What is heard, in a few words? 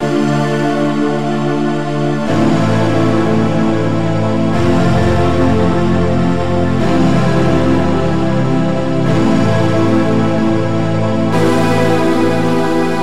Cryogenic Dimension; Magnus Choir